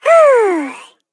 Bee Used Sound; Jolly Battle; Android

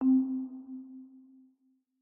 Home Screen UI

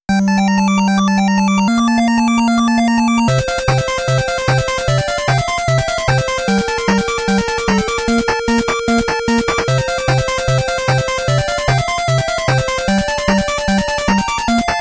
Stage BGM; Ninja JaJaMaru Collection Original Soundtrack

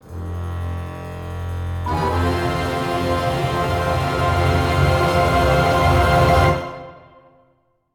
Stinger; Sogport Factory

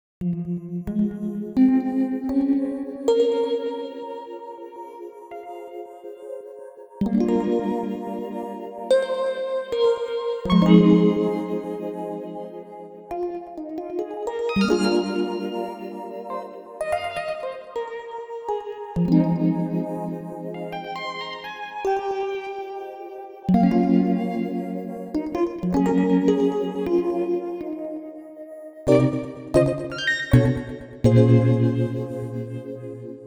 Chords

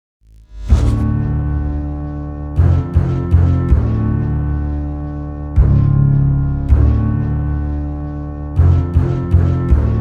Cinema Thriller Orch

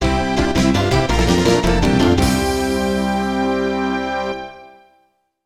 Jingle (MT-32 Version); Hiouden; PC-9801

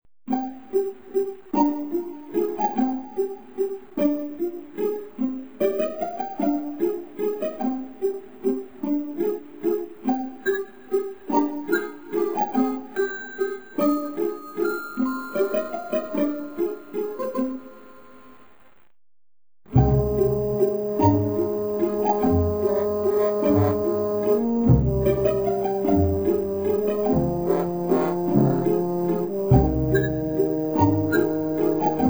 Radio Song; Smeshariki Museum of Communications

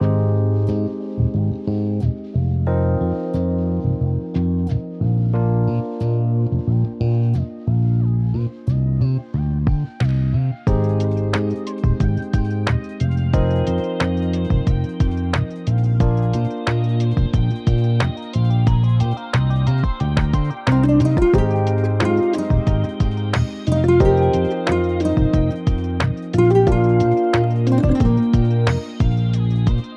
Forest Interlude Remix; nintendo lo-fi megamix 2023; Donkey Kong Country 2

